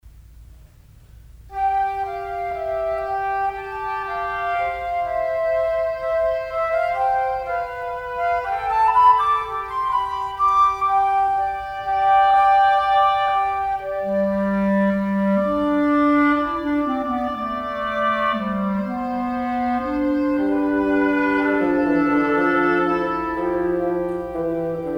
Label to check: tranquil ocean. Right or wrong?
Wrong